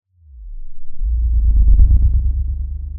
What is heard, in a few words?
pop, Sound of a dinosaur